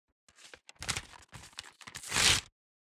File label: paper tear off